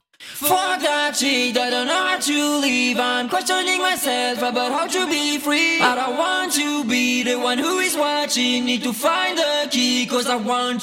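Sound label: pop 84 B minor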